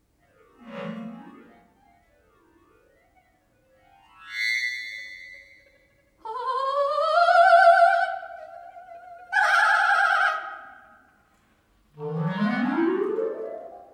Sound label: hohohoho, Sopr.; Emily Dickinsons Uhr